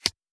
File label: Aquarium Button Up; moul-assets